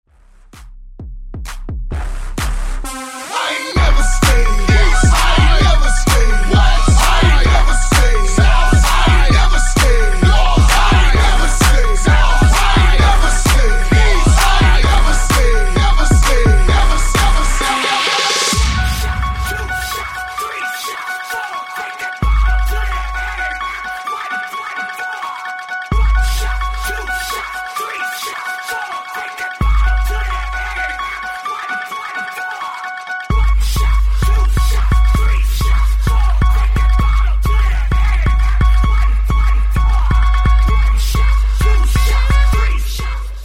Halloween Funky Bass

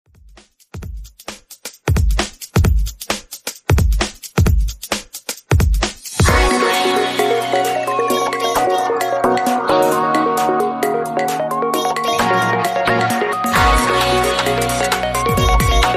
132 BPM

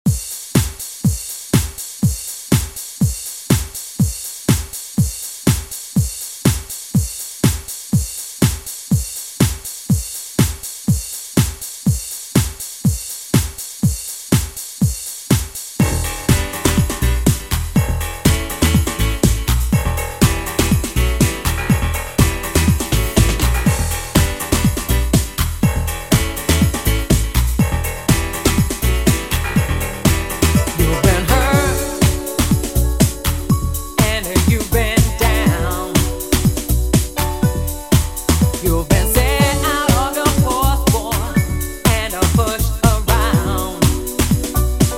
Dj Intro Outro 122Bpm

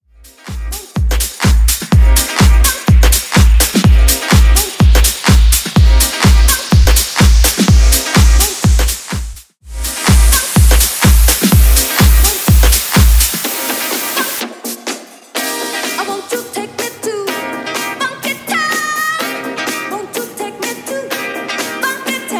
Remix 125bpm

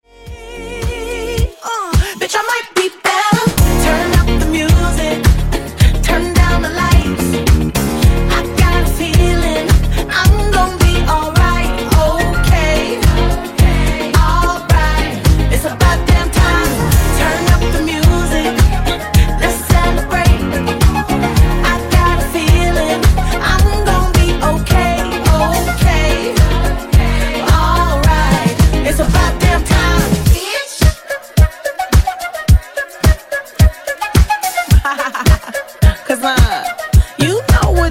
Funk Wordplay Segue; 109 112Bpm

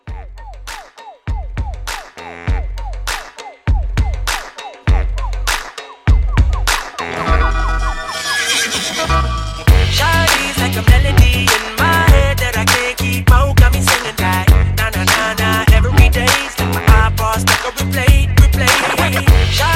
Balkan Mashup Re Drum 100

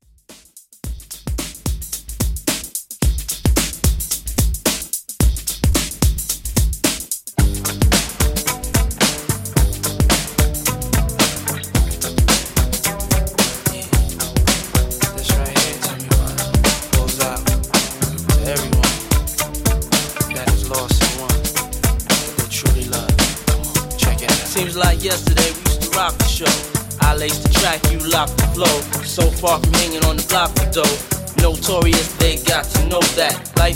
90s extended redrum 110 bpm

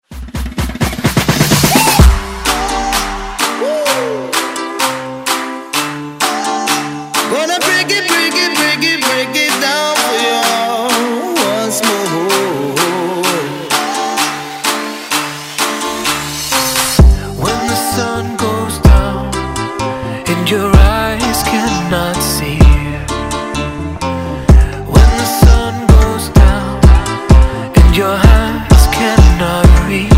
Afrobeat Redrum

